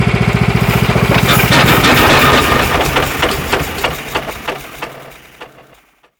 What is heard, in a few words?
generator stop; ambient